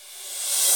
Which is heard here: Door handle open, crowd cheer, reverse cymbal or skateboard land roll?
reverse cymbal